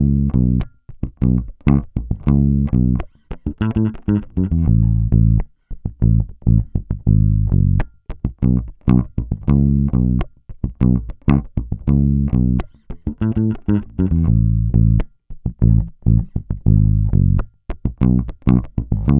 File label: Bass loop 100 Dbm, Electric Bass; Electric Bass Loops; Slate Digital BASSHOLE Sample Pack